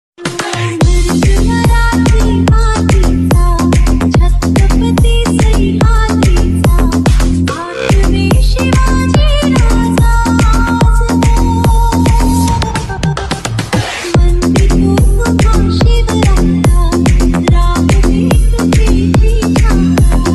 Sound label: ringtone marathi dj ringtone; shivaji maharaj